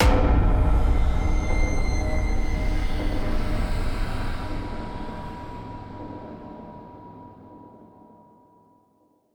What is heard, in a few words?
Stingers sting pain huge